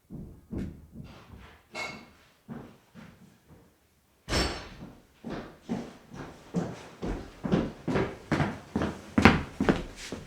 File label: stomping around room, foley